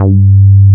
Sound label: BASS G3 P; MR.J BASS